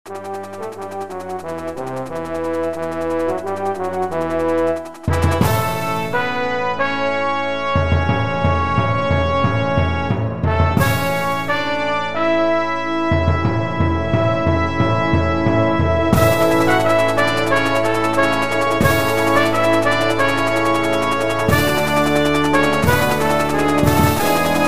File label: Fanfare